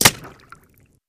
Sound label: Bullet Impacts Water